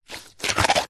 foley wood stick movement slide draw line in sludge wet mud squelch